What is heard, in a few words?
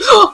gasp